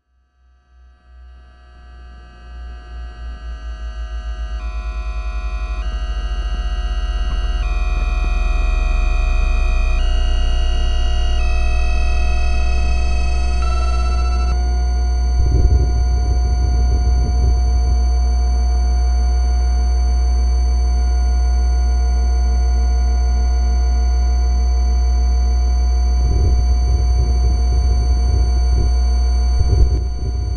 Riding the A Train with Electromagnetic Inducer New York USA